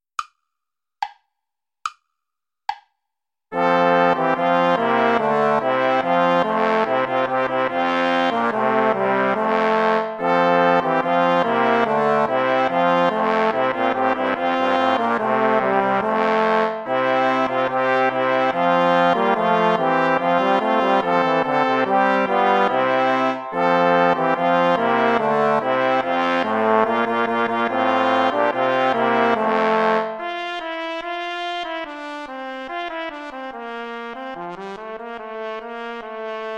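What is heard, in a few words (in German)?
schmückt den saal mit grünen zweigen play along without trumpet; traditional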